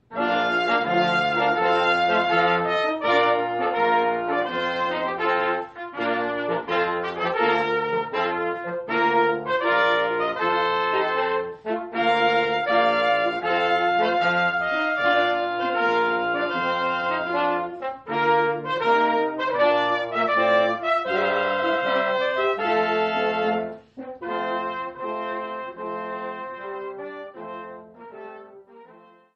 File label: Trumpet Tune